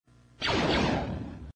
Blaster; Han Solo

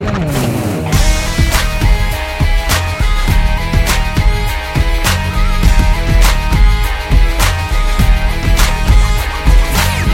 Hip Hop Beat

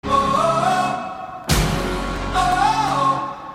Short Sms

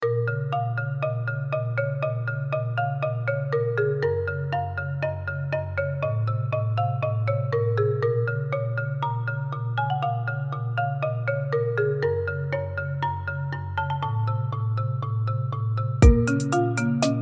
trap

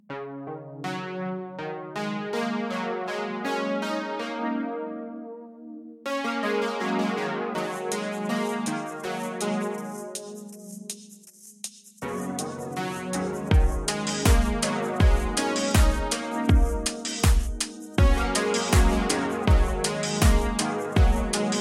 Synth

